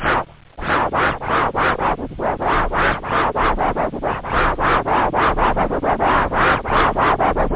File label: scratch; da capo vol1; instruments